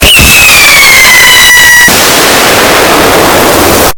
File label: fireworks